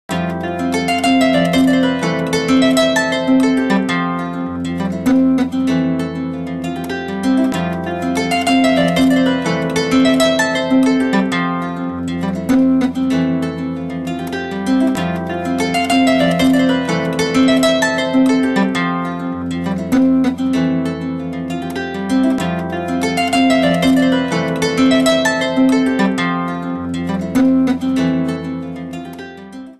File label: Ring Kora African Guitar; Microsoft; Windows Mobile ringtones; Individual download-only (2008)